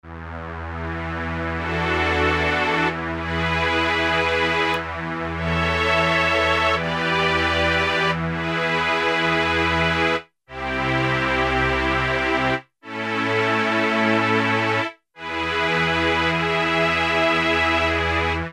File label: Tron Strings, Roland JP-8000; Factory Performances